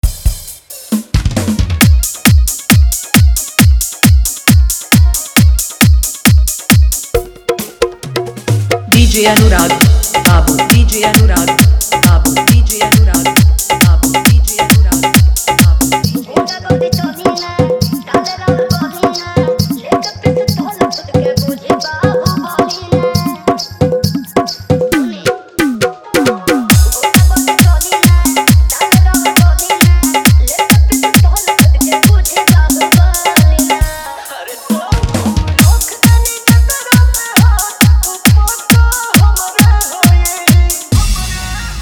New Bhojpuri Dj Song Hard Dhollki Bass Remix

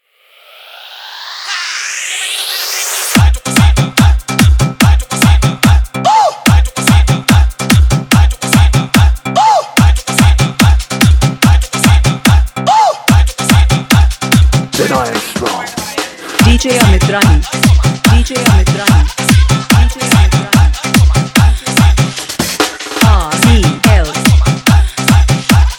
Hard Bass Dj Mix

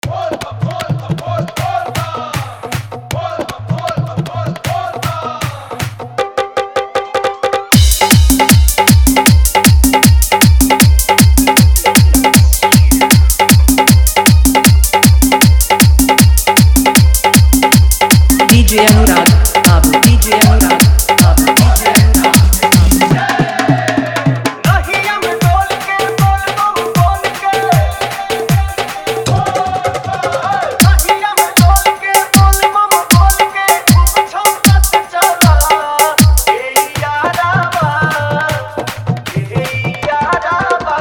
Full Dhollki Bass Dance Mix

